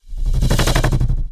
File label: Break Mono Jolt